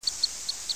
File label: calidris minuta